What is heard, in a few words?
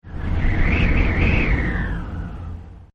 glider Wind; kickbuttowski; mellowbrook